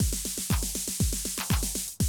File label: 120, Classic Drum Loops